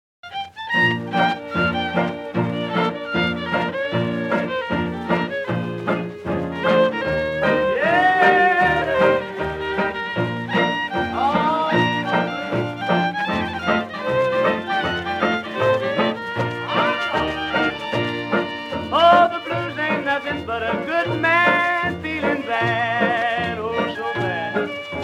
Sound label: Dirty hangover blues